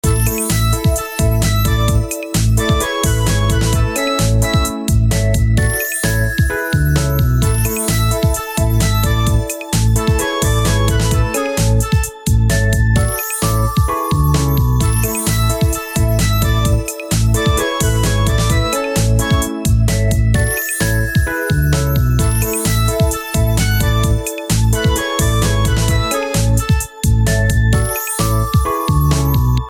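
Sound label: background music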